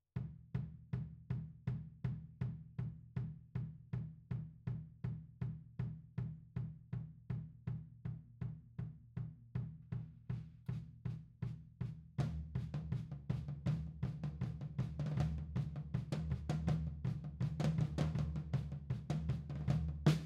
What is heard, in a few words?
Tom, 160 BPM